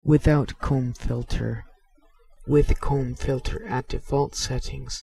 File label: comb; nyquist